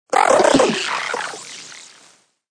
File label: Weird Water, Electronic ripple; Tech; VoiceBox